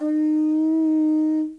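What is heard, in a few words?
African horn D#4, Mozambique horn; Spectrasonics; Heart of Africa 1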